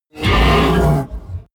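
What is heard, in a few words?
coloss roar deep short, creatures; kreaturen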